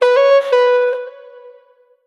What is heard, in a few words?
Vocal one shot Abm; Slate Digital Omnivox Vocal Sample Pack